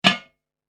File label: Kitchen Cooking Pot Lid; Andrew Fleming